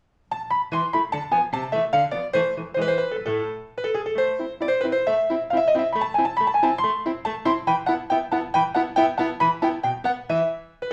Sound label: Grand Allegro; Female